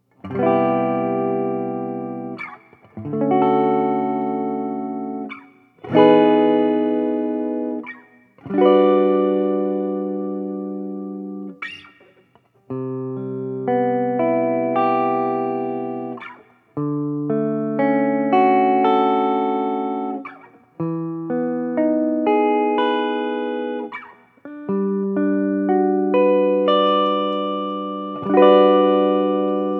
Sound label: clean chords